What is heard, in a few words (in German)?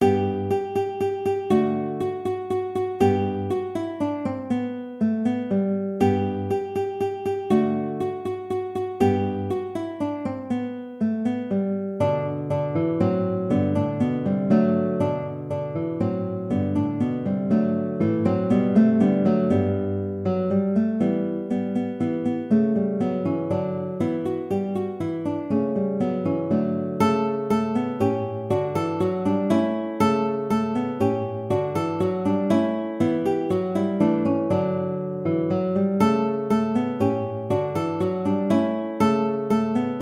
alter ungarischer tanz